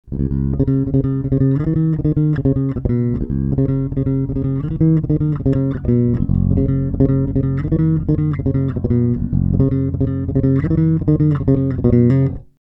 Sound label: low